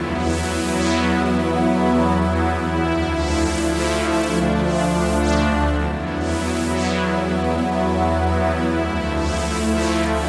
Pad Creamy, Drum & Bass Pads; Sonic Foundry (Sony Creative Software); Knutrix Drum & Bass

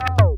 tape fx, scratch; Ambient Grooves

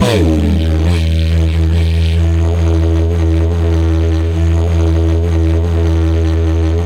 Didgeridoo; Sonic Foundry (Sony Creative Software); Continental Drift Aftershocks

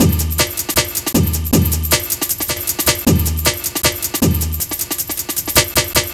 Pesh Break, breaks

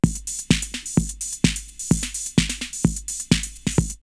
Rave drumloops 128; X-Static Goldmine; 90 s Sample CDs

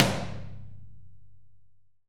HALL AMB TOM

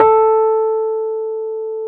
E PNO, KEYS; Synclavier Sampler Library

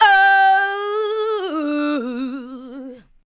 ADLIBS

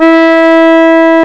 string; medusa; Amiga Soundtracker Sample Packs

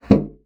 bassoon slap; Xsample VOL-6; C BASSOON

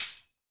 tambourine hit

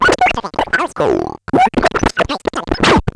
Bent Talking Teacher, Circuit Bent

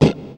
stroke, guitar; East West; Steve Stevens Guitar Samples Collection

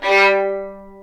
G3, VLNS ARCO; East West; The Ultimate String Collection

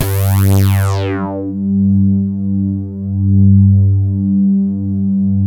reso pad; Sound Gallery - Charged

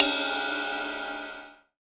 DDD-1 Ride, drum kit; Polyend; Stazma & Concrete Collage; Concrete-DDD1

